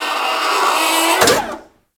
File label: Fuel Injector Open; Warframe; Missions; Sabotage; Sabotage Grn